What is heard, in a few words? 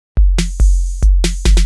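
DRUMLOOP ELECTRO 140; Techno2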